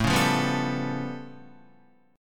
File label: A Augmented 9th A, Guitar; A+9 strummed